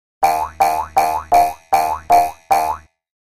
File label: Boings W Twang Boing; boings / twangs / snaps